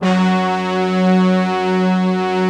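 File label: brass tutti, Slow Brass Tutti; Optical Media International - Sonic Images Library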